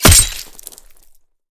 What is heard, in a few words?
hit, knife; cof; guns